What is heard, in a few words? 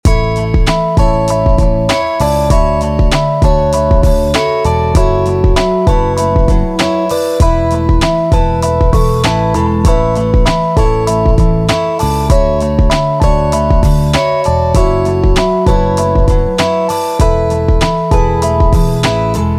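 harmonic rhythm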